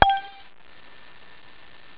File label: rain; chipmusic; sonix; Stellar 7